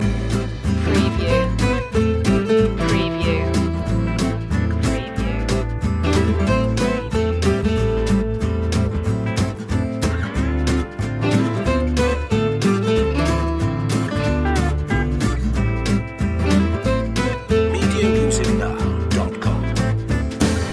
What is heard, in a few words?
left bank blues loop